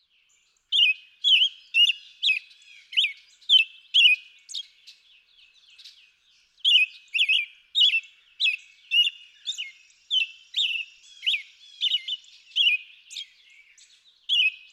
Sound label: American Robin